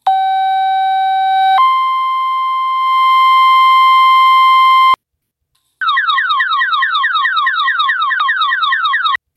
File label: Fire Tone